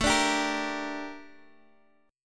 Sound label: G Minor A, chord; Gm/A strummed